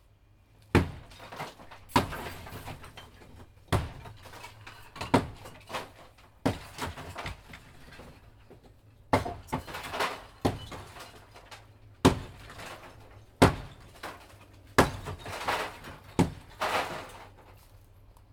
brick wall destroyed with a sledgehammer

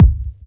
bass drum single shot c sharp key; kick

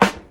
tight steel snare drum sample g sharp key

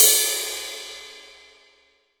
wet crash cymbal one shot c key

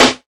reverb snare drum sample c key

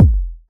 kick sound F sharp key

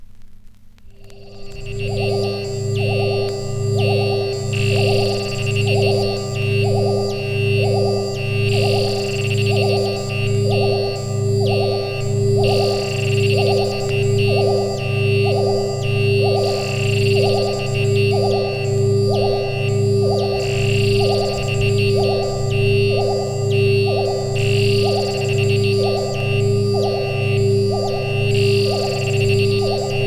the electronic swamp - radio effect - horror

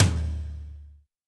hi tom sound d sharp key; tom drum

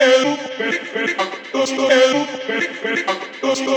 chopped vocals ping pong house delayed - dm - 127

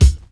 short kick c sharp key; kick drum samples

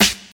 Classic Hip-Hop Steel Snare Drum Sound C Key

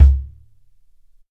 roomy tom sound c sharp key

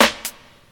airy hip hop snare drum sample d key

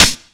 short snare sound D key; Duration 0:01; snare drum samples

